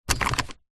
vehicles car hyundai trajet seat belt unclick unlock; 2006